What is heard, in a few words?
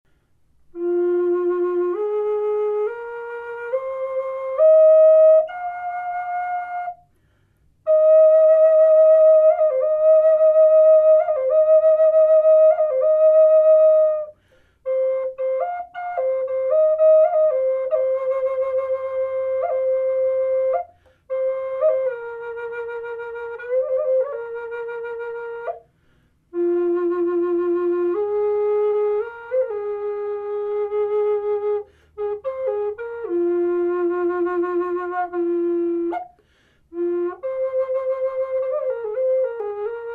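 natural, flute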